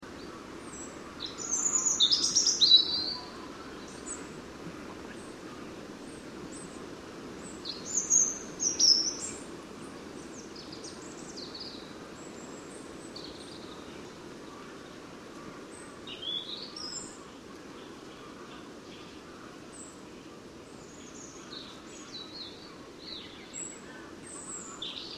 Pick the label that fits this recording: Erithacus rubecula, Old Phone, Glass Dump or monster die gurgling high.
Erithacus rubecula